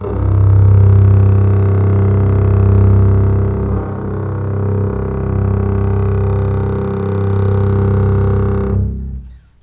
C1 LEG F, String; Ultimate String Collection

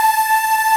Ending loop

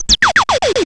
PERC FX

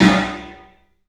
PA DEEP R, CLUB SN VRB; 3D Sound; 3D Drums

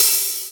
HH OP, Drum Dry; East Collexion; Drum 1

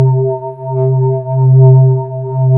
PAD, Synth Pads; Sound & Vision; Gigapack I CD 2; PAD SYN-PADs; PAD Synth-Pads 4

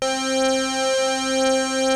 PPG C4, Rare Synthesizer; Hollywood Edge; Giorgio Moroder Rare Synthesizer Collection; PPG.2.3

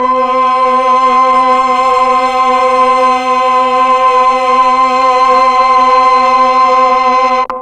MELLOTRON vox C4; Big Fish Audio - First Call Vintage Keyboards

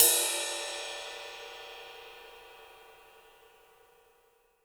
Gretsch Ride Cymbal Hit; Sonic Foundry (Sony Creative Software); Vital Drums

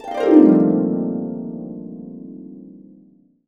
Bb maj, Harps; USB Soundscan vol.62; Complete Harps; AKAI

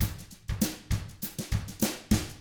FUNKY, Drums

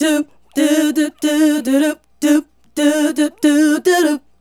Female Group, Hip-Hop and RnB Vocals

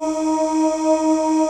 aahs; Greytsounds; F2-SYNCLAV 2

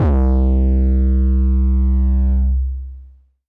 Synth Prophet Bass C; Electronisounds

